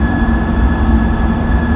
buzzer machine; russian sounds

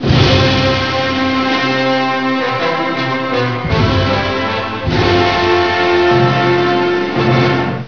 prelude

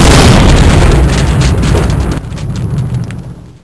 boss create; svencoop; adams dungeon

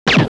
fire, beretta

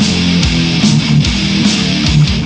beat

